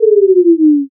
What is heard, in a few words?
debuff